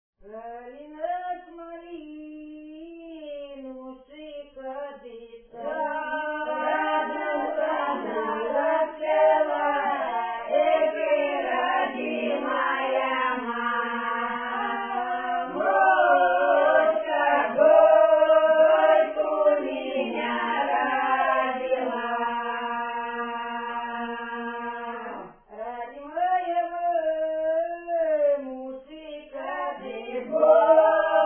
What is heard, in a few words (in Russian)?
плач невесты-сироты, с.Китово Касимовского р-на; Вы подуйте-ка, буйны ветры